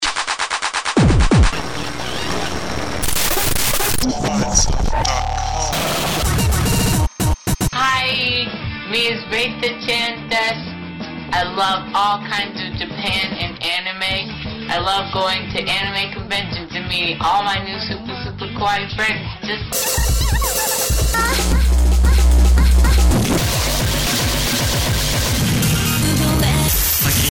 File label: Farting in public; but calling it kawaii so it becomes cute even though Im an overweight 40 year old